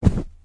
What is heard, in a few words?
wear, clothes